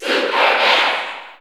Cheer English; Ness; SSBU

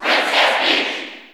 Cheer English; Peach; SSB4; SSBU

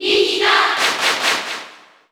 Cheer Japanese; SSBU

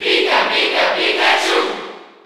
Cheer French; Pikachu; SSB4

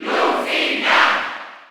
Cheer German; Lucina; SSB4

